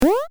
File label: jump short